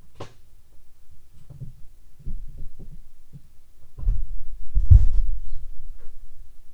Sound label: closing right cabin door, A320 - 321 Aircraft